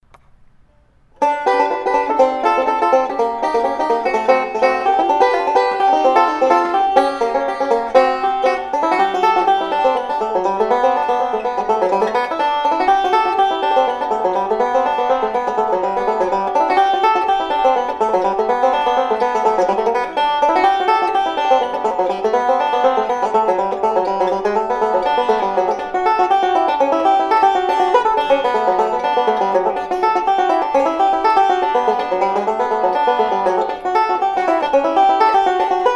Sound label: archtop, banjo